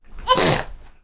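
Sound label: baby sneeze, human laughs; KABOOM! - Wild and Wacky Sound Software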